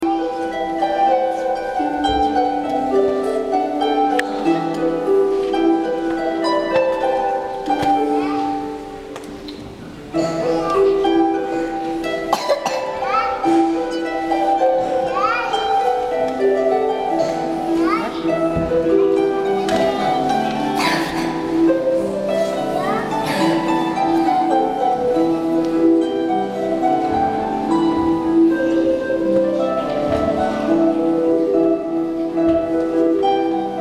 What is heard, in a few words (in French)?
harpe